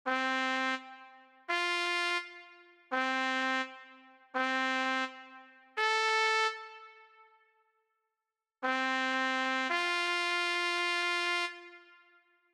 Trumpet; 吹奏楽; R6 吹奏楽部 卒部式

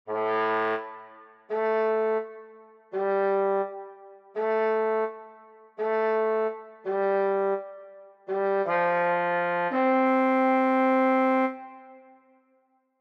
Trombone; 吹奏楽; R6 吹奏楽部 卒部式

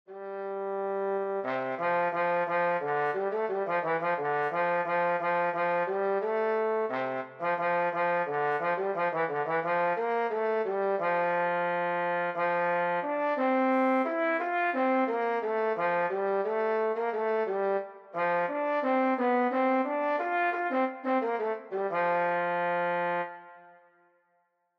Trombone; R6 吹奏楽部 卒部式

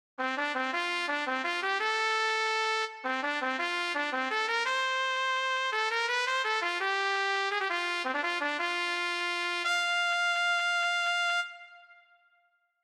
Trumpet; 吹奏楽; R6 吹奏楽部 卒部式

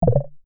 breakpoint; Microsoft Visual Studio; BuildTools

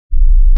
Censor Bleep 1kHz

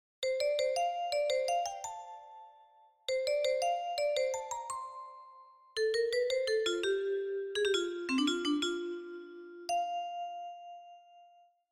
Vibraphone; 吹奏楽; R6 吹奏楽部 卒部式

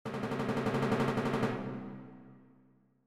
Timpani; R6 吹奏楽部 卒部式

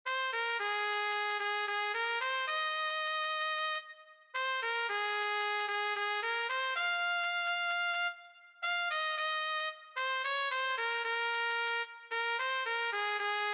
Trumpet; 吹奏楽; R6 吹奏楽部 卒部式

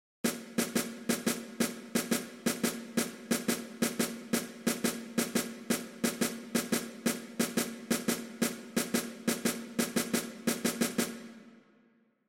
Snare Drum; R6 吹奏楽部 卒部式

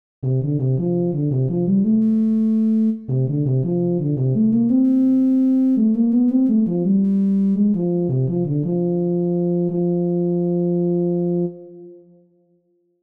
Euphonium; 吹奏楽; R6 吹奏楽部 卒部式

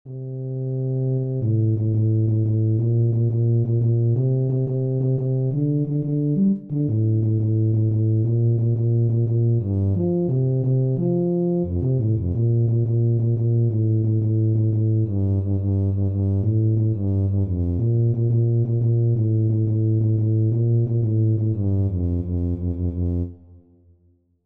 Tuba; R6 吹奏楽部 卒部式

